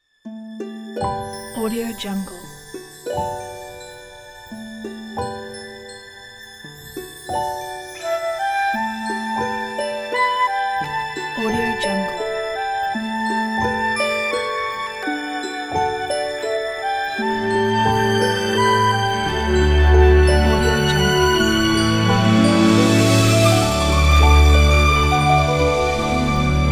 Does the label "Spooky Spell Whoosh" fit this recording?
No